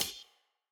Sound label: closed hh, crate; eddyflux